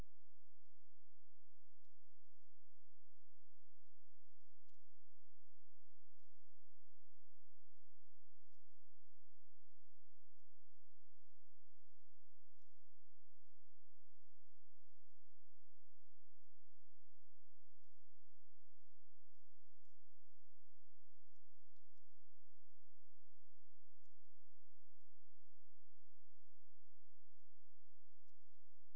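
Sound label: hydrophone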